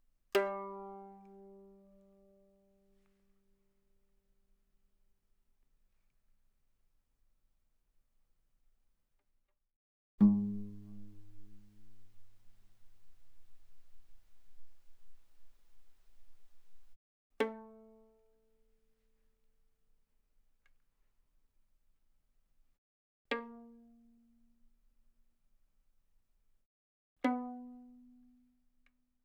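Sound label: Violin pizzicato fortissimo sul G G3 B3; Strings; violin2012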